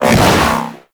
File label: Hoverboard Ground Spin B; Vehicles; Warframe; Venus Hoverboard